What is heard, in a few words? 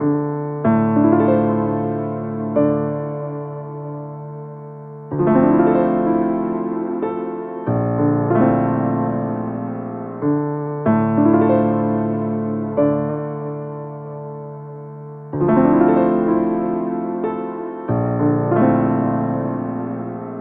Kit Piano Dm 94, Lo-Fi; Loops; GhostHack; HipHop